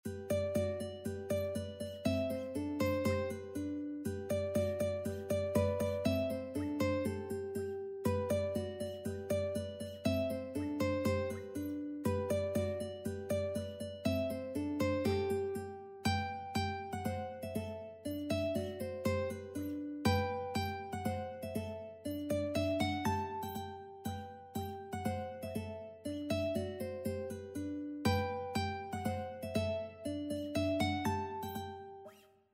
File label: duet ukulele; Russian Air by Ludwig Van Beethoven; classical ukulele fingerpicking duets